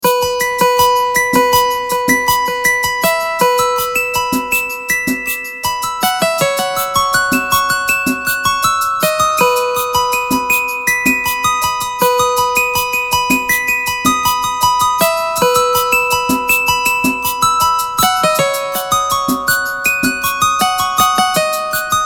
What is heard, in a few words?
BEAT GUITAR, Beat Guitar Drums High Soft; Miscellaneous Loops